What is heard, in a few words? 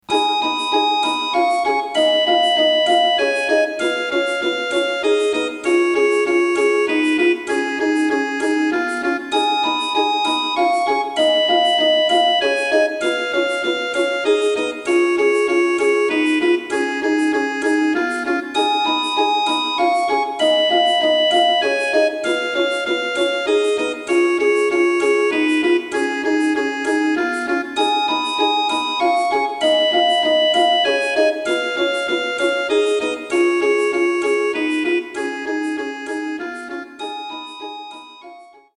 Nzakara Harp Songs; Brenner; Vienna 2007